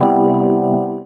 Chords Tones; Equipped Music; Loungin House

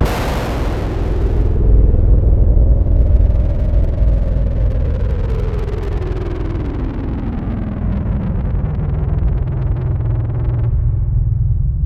bomb; ANALOGICS 1; Back In Time Records - Big Bang

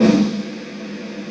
SNARE VERB; E-MU Formula 4000 Series Vol. 3; Analog Odyssey; VK Instruments

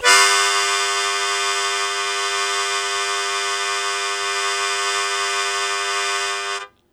Blues Chord Attack G1, Harmonica; Zero G - Harmonica

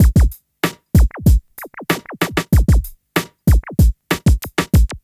Street Tip, R&B Drums

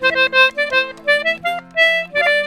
C5 L, Celtic Flavours; USB Soundscan vol.42; AKAI

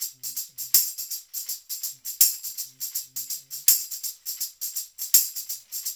loop, percussion; InVision Interactive; Percussion Slam; SAHARA

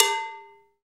PER COW, Cowbells

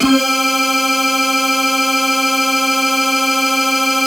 synth clav

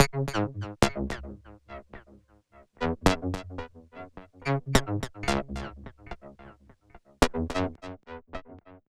SYNT, Hip-Hop & R&B; USB Soundscan vol.47; AKAI

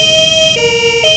SIREN; M8; CMI Factory

